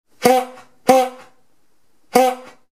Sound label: 16inch Serpentine, squeeze horn